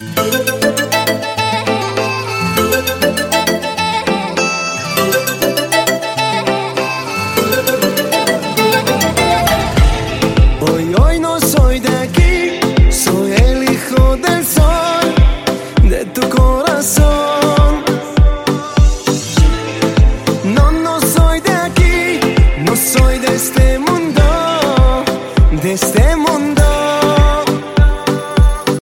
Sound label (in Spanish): nuevo guay castellano tono de llamada